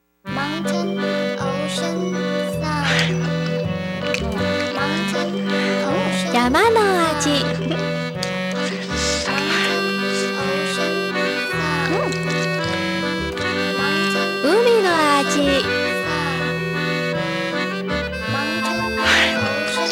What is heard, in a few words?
cf music